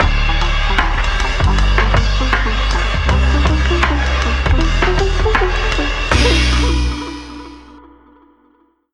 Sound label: VICTORY JINGLE; fortunes run ost